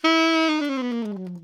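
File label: alto long fall E 3, sax; Greytsounds - Philip Wolfe David Crozier's Saxophones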